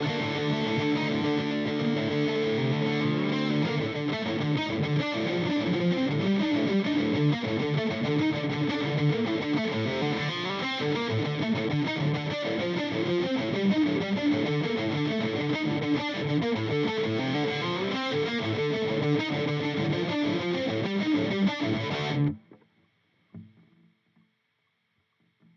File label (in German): HX Stomp MB Dual Rectifier Kompressor; Vergleichstest